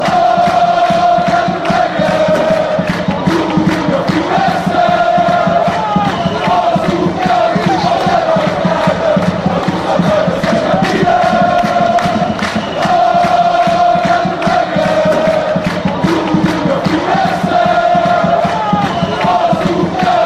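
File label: braga chant, football songs and soccer chants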